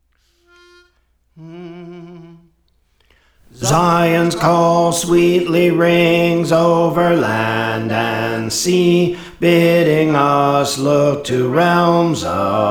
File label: Bass; Praise For The Lord Training